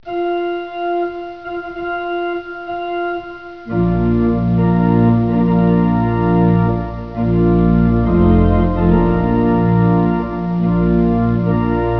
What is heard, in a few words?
wedding March